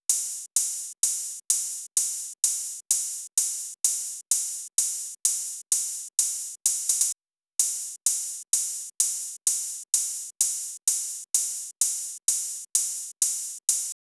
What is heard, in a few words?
Drum Loop 128BPM OH; Loop Elements; VEC5; Drumloops; Multi Loops